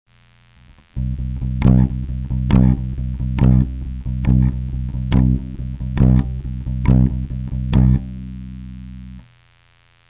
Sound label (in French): accent, bass; main droite